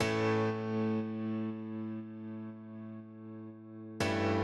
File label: Piano 120 A